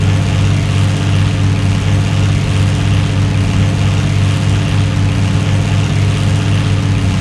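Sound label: maus engine interior rpm load; War Thunder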